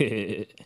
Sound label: laugh